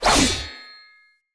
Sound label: Dagger Heavy Whoosh; Weapons; Dendrit